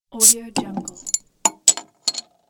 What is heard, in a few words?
bottle opening